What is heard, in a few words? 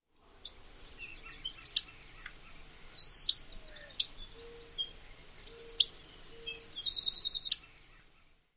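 call, Varied Bunting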